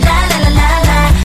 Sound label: Lalalala